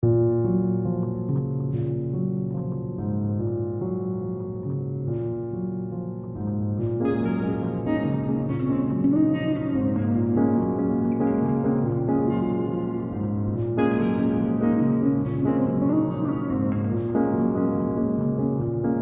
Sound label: Guitar Piano Jam